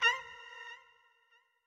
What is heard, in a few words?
Toxic Mask Hurt